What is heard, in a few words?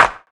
hand clap sample a sharp key; single hits one shots